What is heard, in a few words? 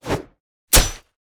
base emote dance; LoL; aatrox